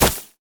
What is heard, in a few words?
bandage toss; LoL; badrobotamumu